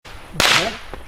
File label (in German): Silvester Knaller